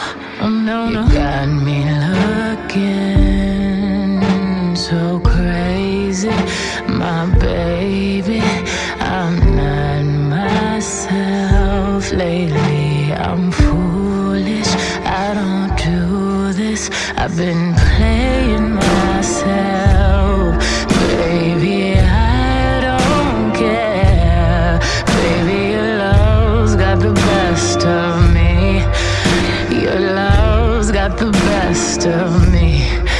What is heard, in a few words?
Best English Ringtone